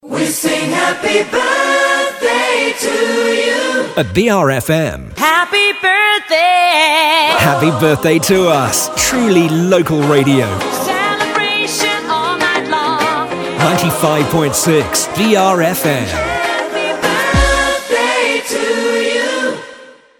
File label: Generic Happy Birthday Sweep